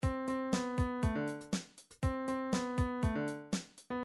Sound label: funk, midi